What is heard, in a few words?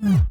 voice off; opensb; interface